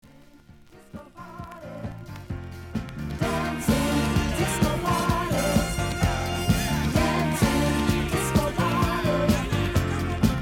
DISCO

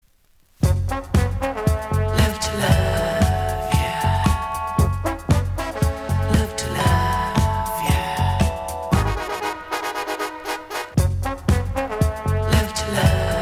CLASSICAL SALSA

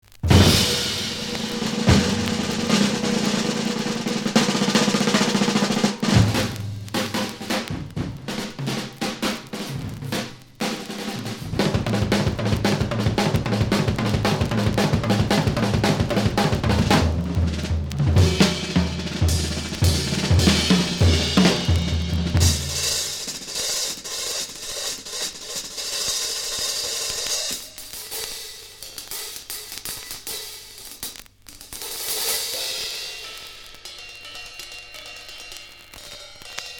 DRUM BATTLE, JAZZ DRUM

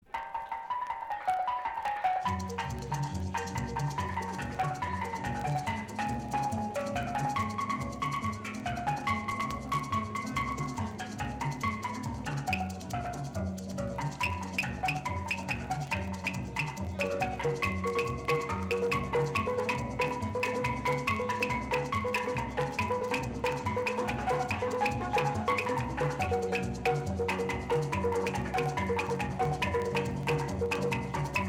STEEL DRUM

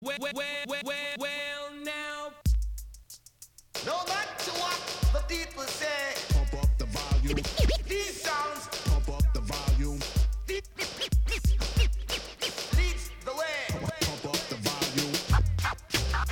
HIP HOP REGGAE SCRATCH MIX

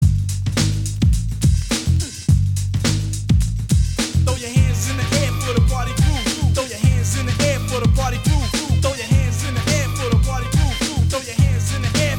BASS MIX

